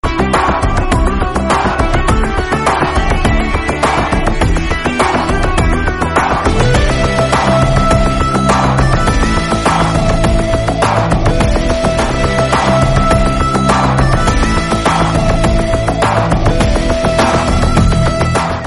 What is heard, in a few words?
Rock Theme; Parugu